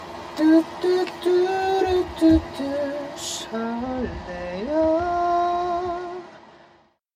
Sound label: hum